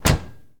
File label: Firebird Door Close; Automobiles